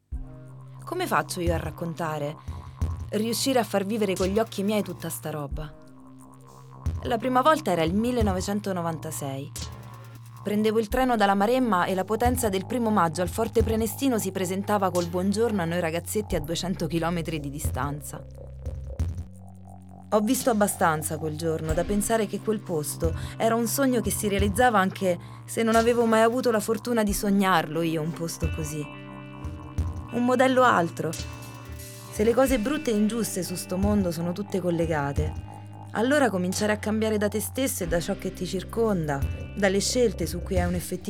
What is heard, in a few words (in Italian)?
musica voce